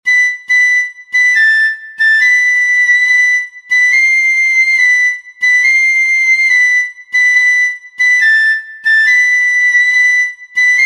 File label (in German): Morgestraich mit 4ter Stimme Piccolofloete